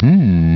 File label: mmmm; mk2; Reptile